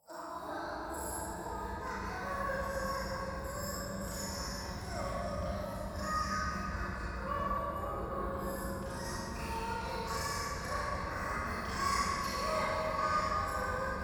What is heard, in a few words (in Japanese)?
廃墟 低音喘ぎ 電車